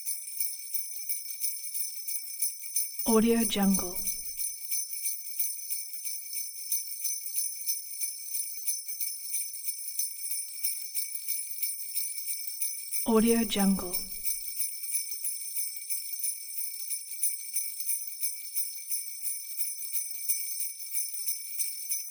christmas loop sleigh jingle bell hanging fast steady dry